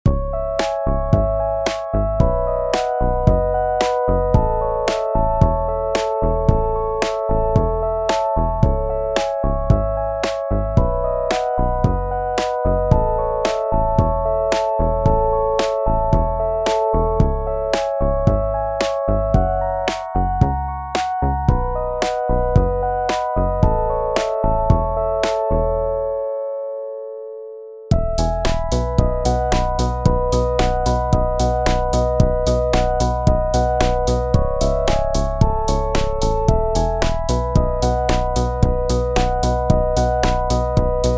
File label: pop